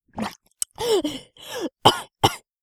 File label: female air rough; zps; Humans